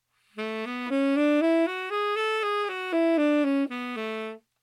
A major scale, saxophone

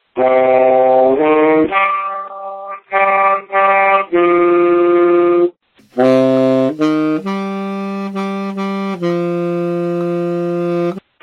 5 notes comparison saxophone

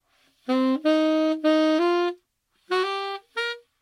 slow tenor saxophone

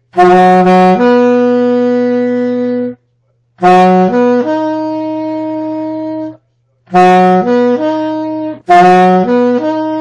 Taps saxophone

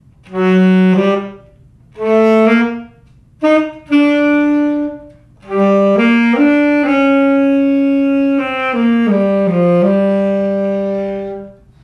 by ear saxophone

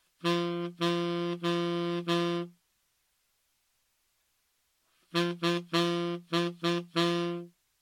downbeat example

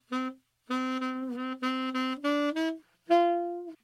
alto first phrase, saxophone

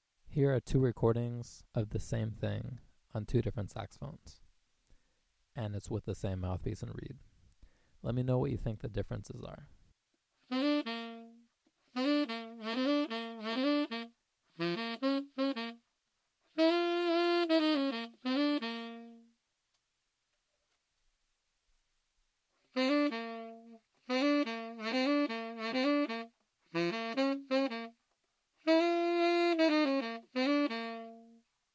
two saxophones comparison

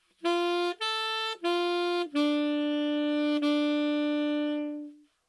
saxophone solo slow

